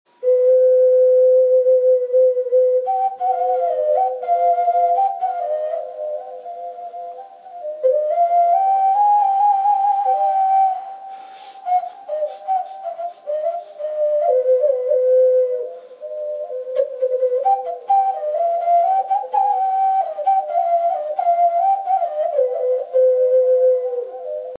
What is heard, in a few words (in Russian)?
okarina zozul ka